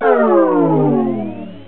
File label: SHUTDOWN; special effects